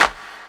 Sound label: Claps; Vengeance Samples